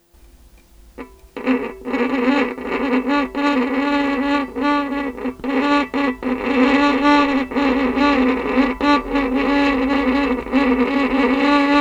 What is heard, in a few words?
Violin Extended Technique